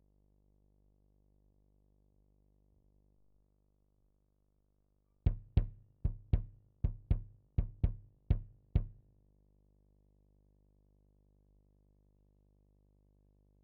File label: Kick